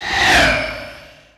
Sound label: beast ghost dash